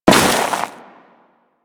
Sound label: Crewman Step Ice, Footsteps; Warframe; Corpus